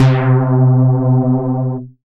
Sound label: Synth Bass, Roland